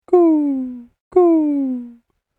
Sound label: Dove, Animal Impersonation; Bird Sound Effects